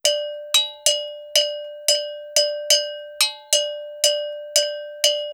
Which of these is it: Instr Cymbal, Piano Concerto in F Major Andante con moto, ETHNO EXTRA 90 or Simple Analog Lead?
ETHNO EXTRA 90